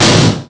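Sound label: Collision Exterior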